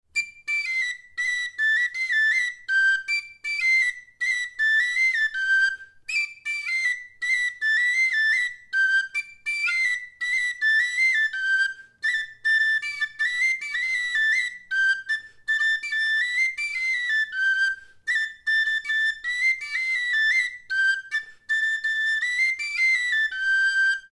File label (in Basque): Txirula melodia 2 DO 80 BPM